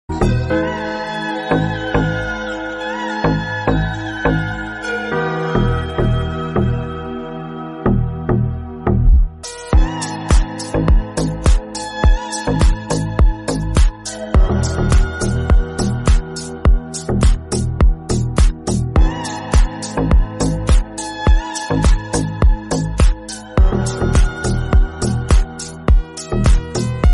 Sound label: instrumental ringtone; english ringtones